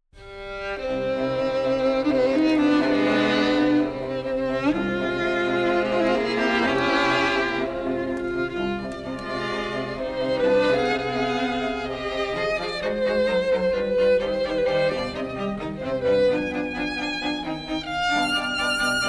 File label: strings; beethoven